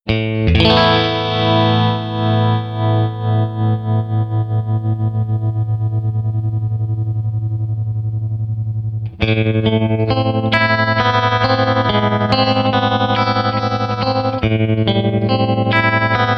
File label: Tremolo Vari Speed